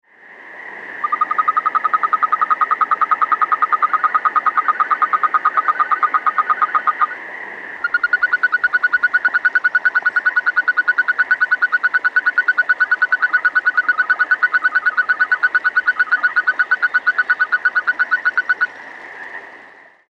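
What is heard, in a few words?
bcanorus, frogs; This is a 20 second recording of two male toads (including the one in the video below) calling in the same location described above.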